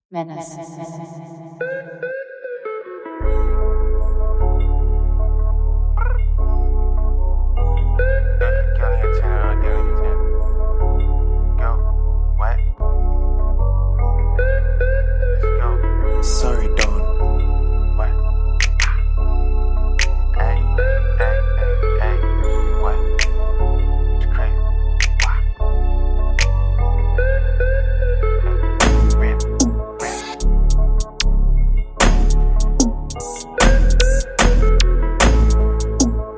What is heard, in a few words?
Instrumental